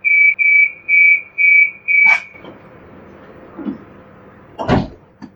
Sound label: door closing